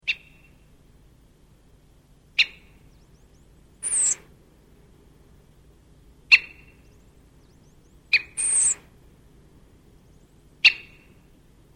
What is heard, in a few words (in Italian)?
tordo sassello; faunistico